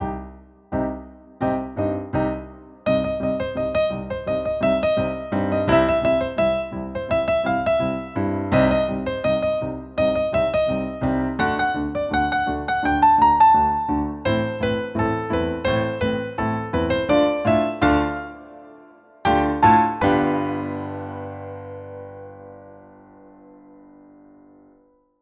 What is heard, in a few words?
opening duet melody